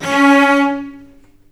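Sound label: C#3 arco long cello, left; East West; The Ultimate String Collection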